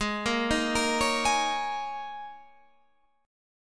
G sharp Suspended 2nd Suspended 4th G sharp Guitar Standard; G#sus2sus4; arpeggiated; JGuitar